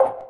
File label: object collect